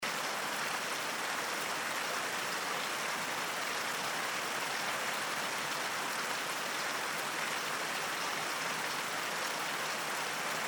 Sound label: Rainstorm